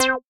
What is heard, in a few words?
synth